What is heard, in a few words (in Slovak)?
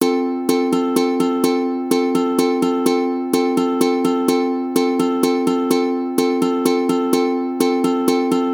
Valčík F dur volnejsie tempo waltz